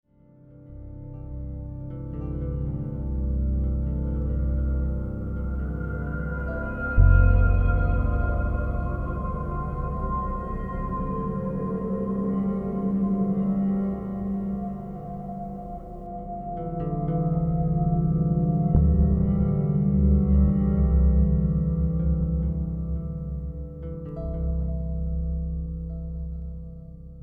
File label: Color Song Interlude